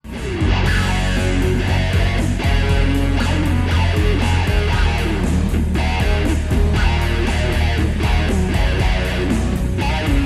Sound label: Band Pass Filter with Heavy OD